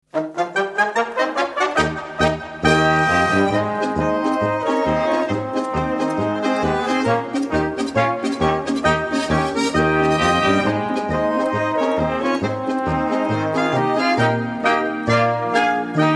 polka; goassbergpolka

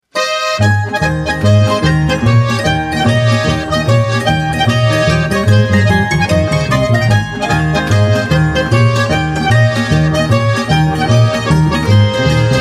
a zither und a geign

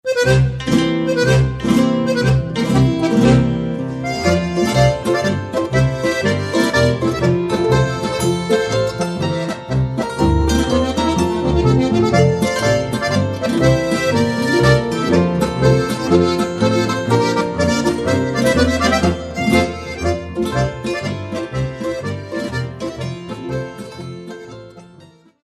salzburger zwoaschritt polka

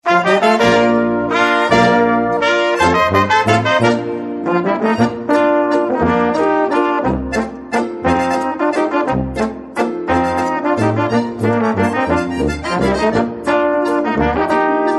landler

